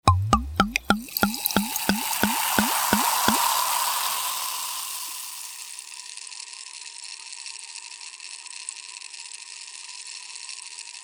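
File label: drinks champagne pouring in and bubbling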